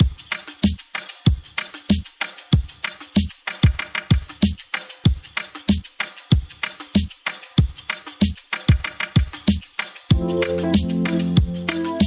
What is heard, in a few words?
Groovy Chill Funk